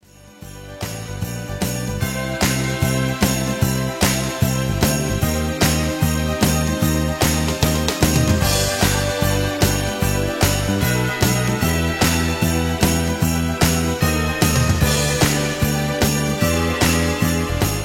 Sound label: Instrumental